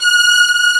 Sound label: STRG B1; East West; Global Sounds